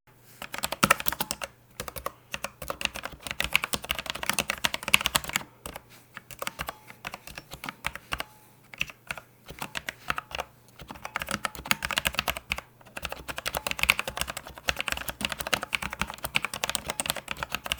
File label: k70 rgb mk.2 low profile